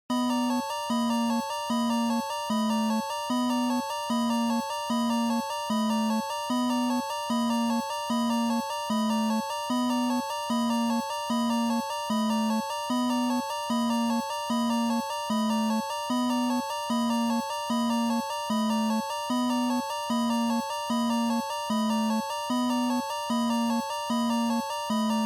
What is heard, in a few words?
Shooter Boss, bgm